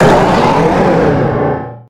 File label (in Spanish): Grito de Mega-Salamence; Gritos de Pokémon de la sexta generación